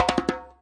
djembe roll A; ETHNIC and WORLD PERCUSSION

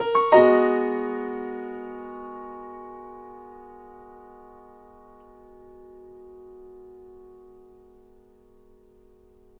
chill piano 90 Abm9; melodic samples; keys organ piano

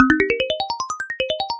150 arp gamelan arpeggio; SYNTH AND ELECTRONIC LOOPS

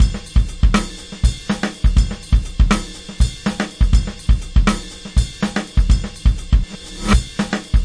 122 bpm natural ride funk, drum loops and breaks

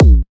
melbourne bounce Kick F; Hip Hop; STYLE KITS; D3ron Melbourne Bounce Kit

